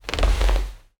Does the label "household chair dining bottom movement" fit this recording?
Yes